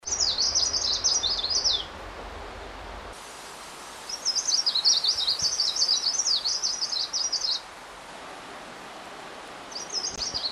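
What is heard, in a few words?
Phylloscopus trochiloides; Dacha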